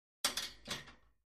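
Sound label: Gym Weight Equipment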